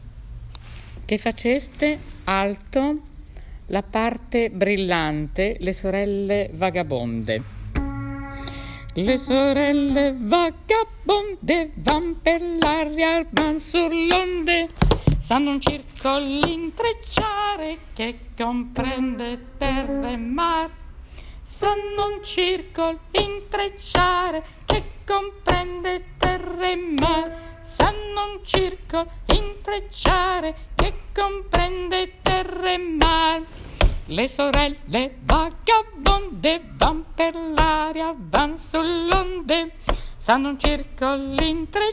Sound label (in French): allegro alti